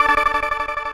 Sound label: synth